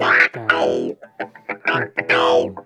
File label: WOW, Funk Guitar; 90 s Sample CDs; Zero G